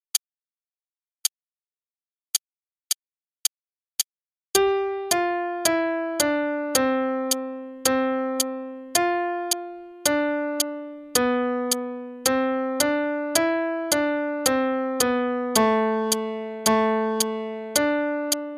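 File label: Starlit Melody, piano; Faber Adult All-in-One Book 1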